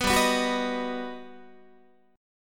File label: G Augmented Major 7th, Guitar; G+M7 strummed; JGuitar